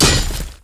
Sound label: basic attack; LoL; tryndamere